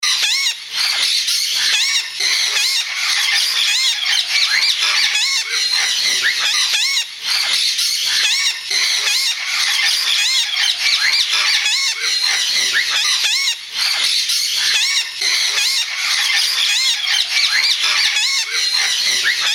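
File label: Moustached Parakeet, parrot calls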